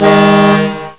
truck horn

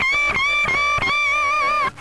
hi lead, rock

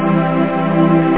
chord

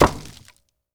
landing

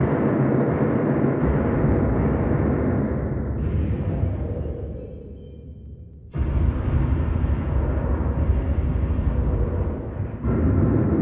weapons; Team Alligator

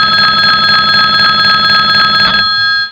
ringing; einstein

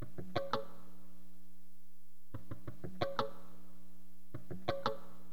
SKANK, HipHop Fx; LiveAct Pool 1

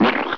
SPLAT; Screen Wreckers

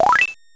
Yoyo; Treasure Galaxy (1994); Learning Company

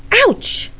ouch; Battle of the Superstars

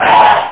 DEATH; deathfx

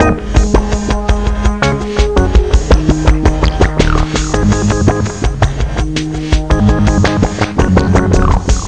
808 base; meetmediaband

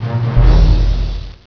Boomba Hey!

